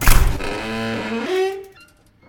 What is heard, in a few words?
open, door, metal; open close